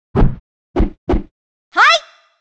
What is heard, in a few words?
shout